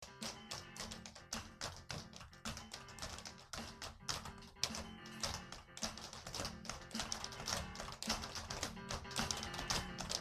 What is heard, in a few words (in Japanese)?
FunkRock練習